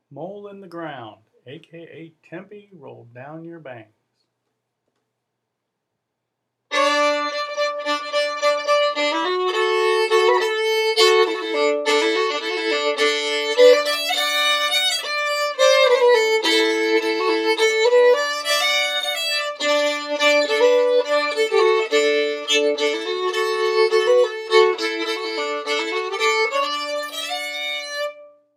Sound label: fiddle; festivaltunes